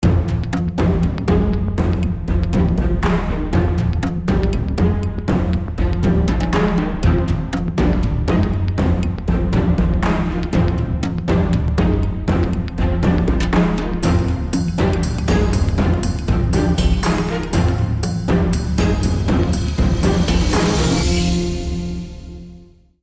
sewer pursuit music; Disney Infinity 2.0 Marvel Super Heroes

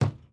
Chair Creak; this is the zodiac speaking 2020 gamerip switch ps4 xbox one pc macos

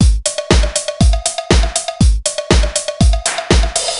Disco Flourish; gamerip; Happy Feet Two; nds

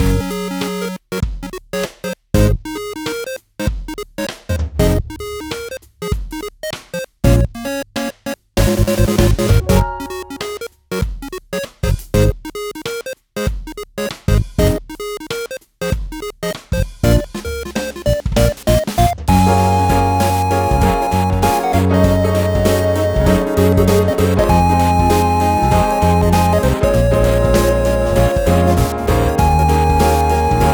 Fellowship Upbeat; Phoenotopia Awakening

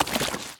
Pickup Bleach; The Inn

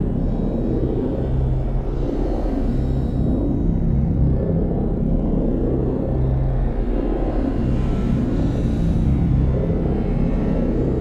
Ambiance Shrine Loop; Masters of Anima

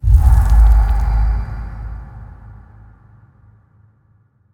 shadow clone appear; sakkaku

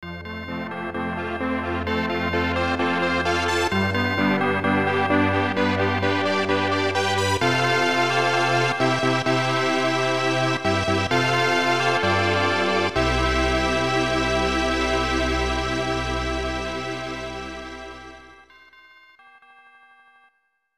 Wedding Bells; Estpolis Denki 2; Lufia 2 Rise of the Sinistrals; snes

